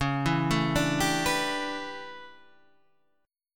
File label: A 11th Db, guitar chord